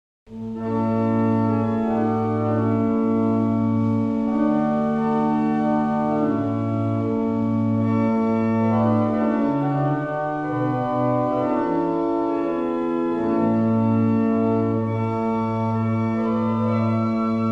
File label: Dismissal, barrel organs; Avington